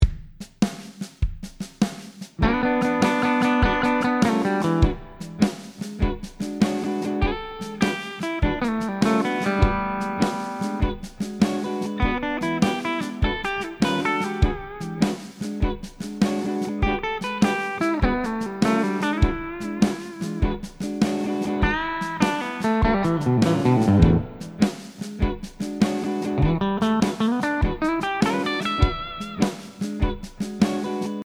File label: guitar